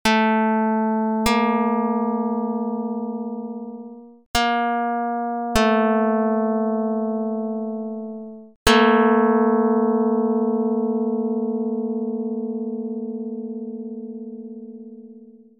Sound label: pluck; Jid 14 13; dr220